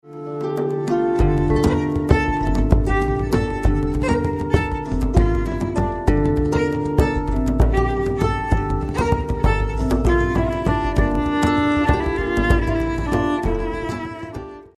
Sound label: bodhran; bodhran en duo avec djembé